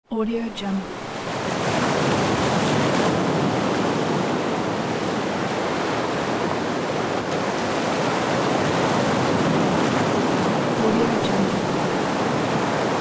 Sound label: ocean waves